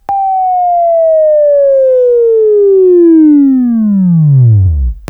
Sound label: WAV Right, Sweeping; Sweeping Training program